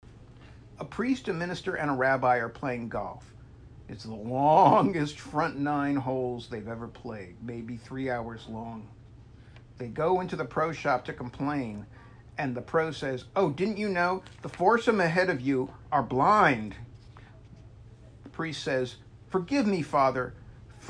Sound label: Laugh Track; Richard Kind; Joke